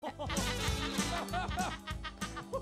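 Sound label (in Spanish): risa, laugh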